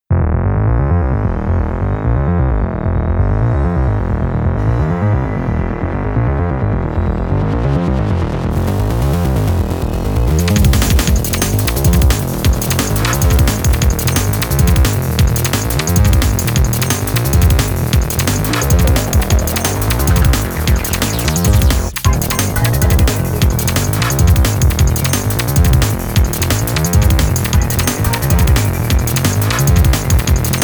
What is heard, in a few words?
Distortion Drive